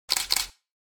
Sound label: Real Canon T5i Camera Shutter